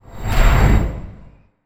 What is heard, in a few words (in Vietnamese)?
hieu ung tieng bass vut qua rat nhanh